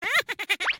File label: wrong